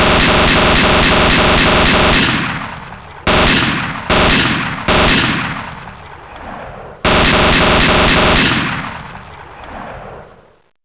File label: MG-42 Salve; Wolfenstein